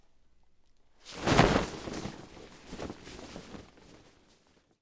Flap Flag Throw Unfurl Dramatically Or Laundry Sheet Or Blanket